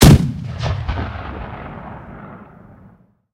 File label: aa fire; guns; War Thunder